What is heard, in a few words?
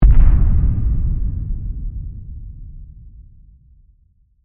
Explosion Space, Distant, Science Fiction; Battle; BlastWave